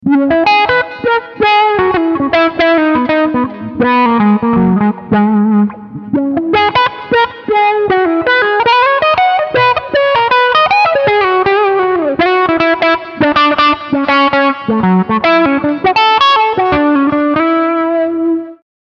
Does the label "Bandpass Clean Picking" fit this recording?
Yes